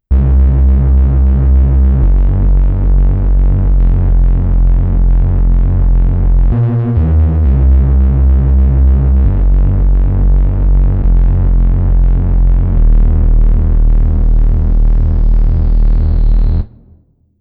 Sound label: blowing bass